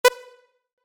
Menu SFX: Navigate, Button; Buttons; Multimedia; Button Sound Effects; Stuart Duffield

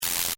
Electric Zap Buzz, Shock, Science Fiction; BlastWave

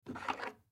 Scissors Grab Cupboard Pick Up; Offices; Household; Blastwave

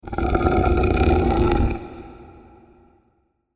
Zombie Deep Growl; horror; Andrew Fleming

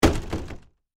Industry Porta Potty Door Close; Miscellaneous Doors

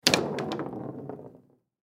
Foosball Table; Foosball Table Kick Ball Roll; Games; Sports

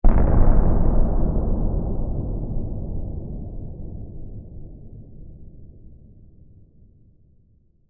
Explosion Distant, Blast; Warfare; Bomb Sound Effects; BlastWave

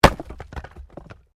Wood Chopping Axe; Chopping Sound Effects; Objects

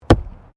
Archery Straw Target Impact; Various archery sounds; Miscellaneous Sports; SoundEffects-Foundation1

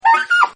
SAX Riff; Horns; Sound Effects:Musical

